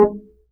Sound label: G string upright bass; Upright Acoustic Bass